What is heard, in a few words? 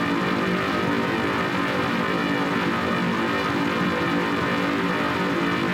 Gas Cover Block; Warframe; Corpus Gas City; Ambience